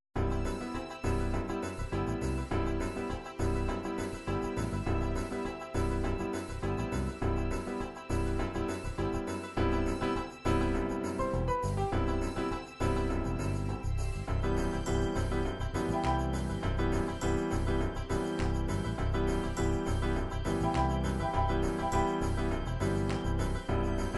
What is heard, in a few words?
disco disco pop, midi